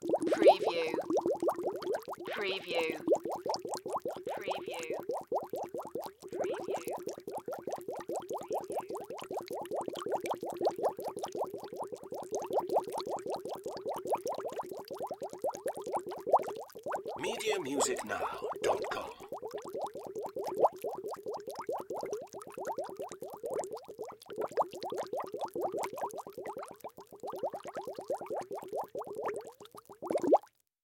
Bubbles Small Long; sound-effects-bible

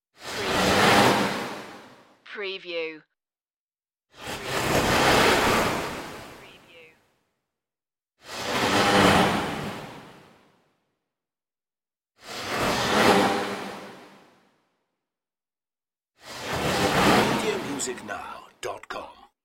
crashing spaceship swooshes

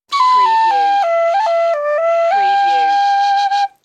Tin Flt Stng

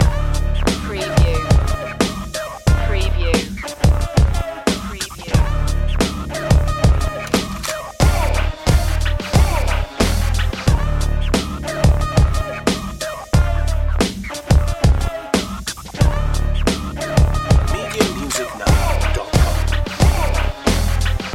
walk da raptor loop

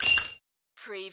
Button Sound - Metal Nut Jar

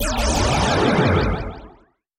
Space Warp

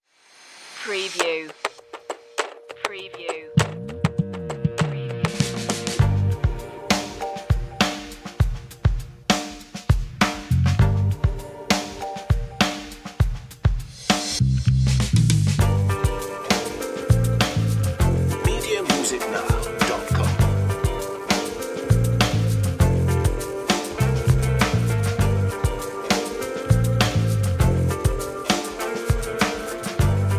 funky chill story

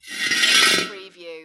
Wheel Drag Short

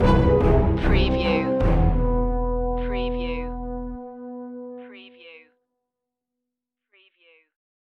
neon nightscape chase loop end

